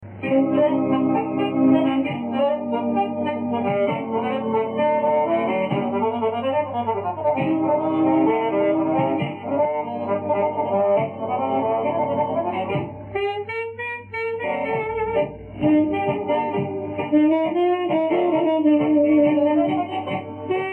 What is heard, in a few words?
orkestra, tango